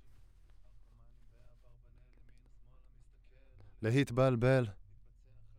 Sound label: Voc OD